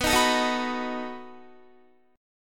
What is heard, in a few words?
B 7th B down Guitar; strummed